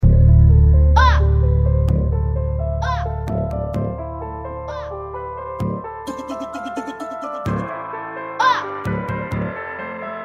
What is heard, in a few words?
EletroFunk Funk; Infinity Studio 2024